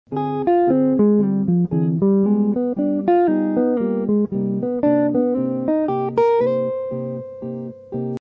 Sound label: minor 2 5 1